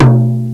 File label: BIKODRUM; fairlight cmi